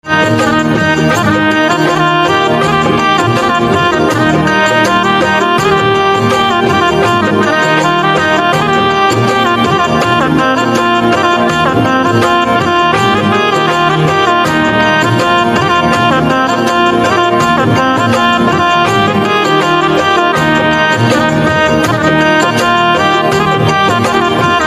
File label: Saxophone